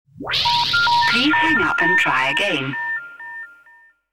Synth Zingers; Stingers

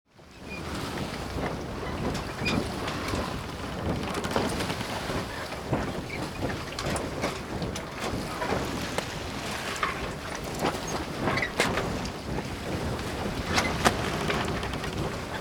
Sail Boat, Transportation

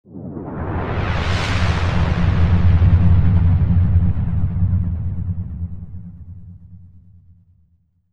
Ambient Effect; iMovie '08 Sound Effects

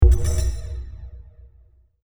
Ambient Hit; iMovie '08 Sound Effects

